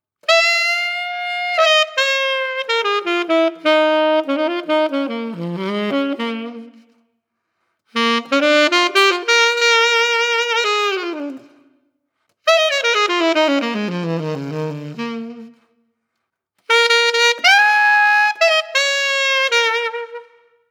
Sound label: Alt Reverb; GS Mark II 8